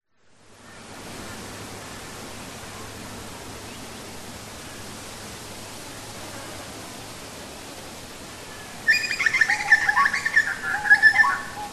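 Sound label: public places park lake ambience birds wind reeds trees distant traffic leaf blower; leaf blower in dist au; zapsplat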